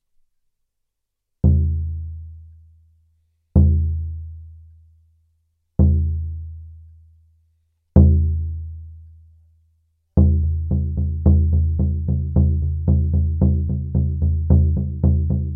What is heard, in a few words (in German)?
Kuhsound, Trommel